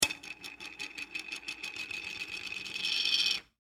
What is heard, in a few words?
Foley Lid Spin On Counter Set Down Plastic; Set Downs